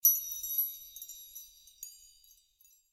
Marble Rock; Blind Quest - The Frost Demon

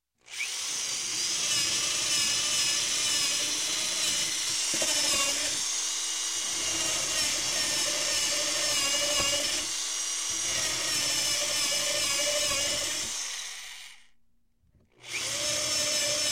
Construction, Drill Drilling Several Holes; Hand; Sound Ideas 2000 Series

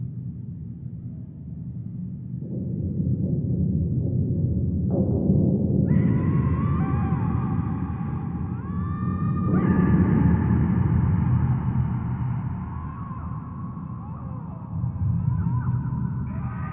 Evil ambient torture scream; Inquisitor 3

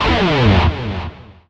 Pickup Guitar Riff; Pickups

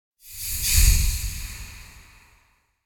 Breath Hiss - Distant Hiss Like Reverb - Closer; Hollywood Edge; sound designer's toolkit v2

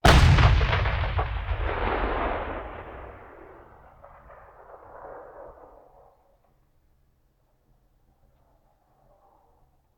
Artillery Cannon Artillery; Sound Ideas; Guns

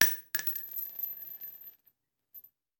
Bullet Shell; Sound Ideas; Guns

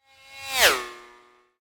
Space Ship By - Space Motorcycle; Hollywood Edge; sound designer's toolkit v2

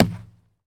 Baseball Hit Wall

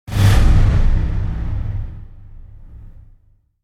Whoosh Low Hitting; Hollywood Edge; sound designer's toolkit v2

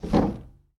Foosball Forward Spin

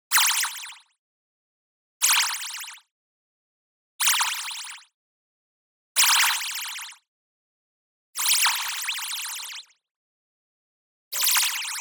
movement fast, warp burst shudder high; boom library; Anime Essentials; DSGNMisc

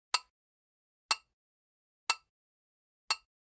Generic Click Glass Tiny

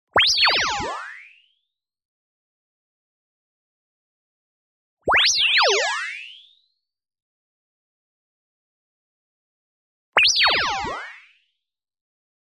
TONAL Modulated Sine Rise; boom library; Anime Essentials

